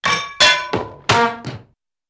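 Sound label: Banging On Heavy Metal, impacts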